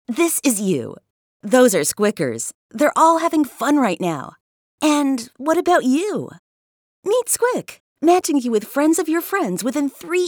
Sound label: HUMAN SQWIK